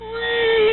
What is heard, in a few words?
monster